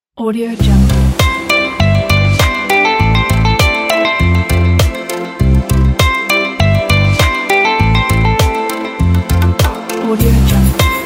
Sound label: motivational reggae